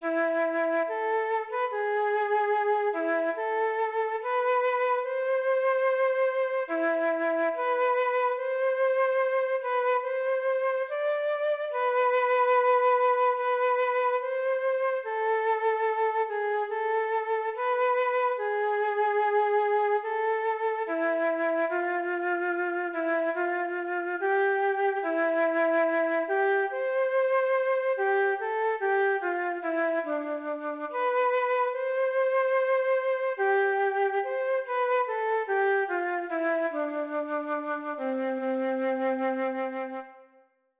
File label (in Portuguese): Sonata para Flauta; Händel